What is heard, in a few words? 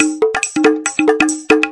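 140 bpm, tribal percussion, drum loops and breaks; 131 to 140 bpm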